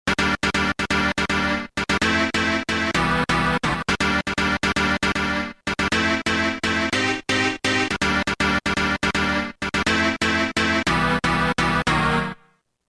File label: Fiesta, Roland M-DC1